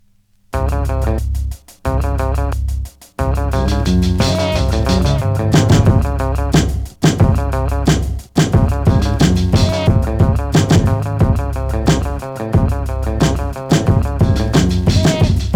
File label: instrumental